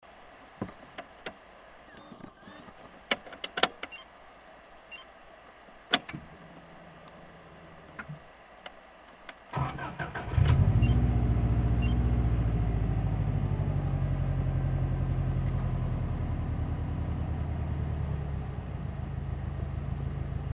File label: ENG START INSIDE